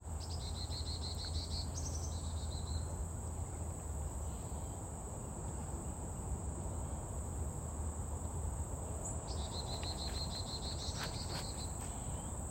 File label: chickadees fav oak tree; field recordings; scout island